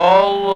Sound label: VOC, Drum & Bass; Ueberschall; Drum & Bass Flash Trackz